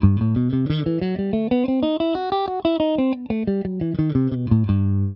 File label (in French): Sol Ionien augmente, Guitare; exemple audio "sol Ionien augmenté"; Mineur harmonique